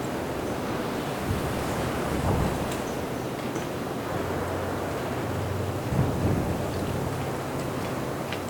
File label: light wind tinks, ambient